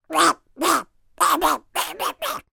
cartoon duck voiced by human shouting angry

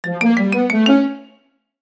cartoon ascend climb sneaky orchestral

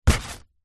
foley cardboard kick lightly; zapsplat

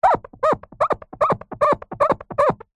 office whiteboard rub finger squeak slow steady

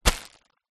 foley plastic bag empty hand grab; zapsplat

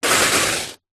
human spit blow water spray

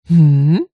unprocessed voice female hmm

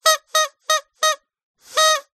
leisure party whistle squawker short bursts; zapsplat

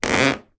wood creak floorboard; foley

